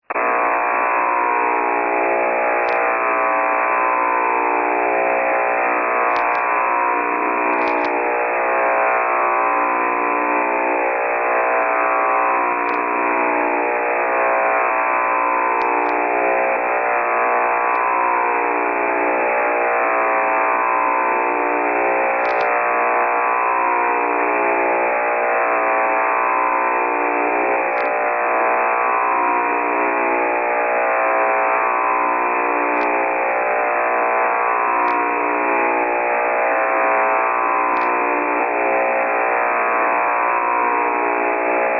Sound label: plasma tv east 160m; Next is plasma TV interference on 160 meters, first with a wide filter, then narrow, as before.